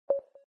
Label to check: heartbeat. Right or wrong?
Right